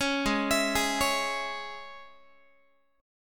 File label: G sharp Suspended 4th Sharp 5th G sharp, Guitar; arpeggiated